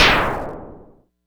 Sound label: Cwejman S1 Tash Tom